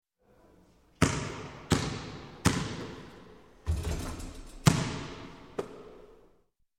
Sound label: Basketball Shoot and Miss; Sound Ideas 2000 Series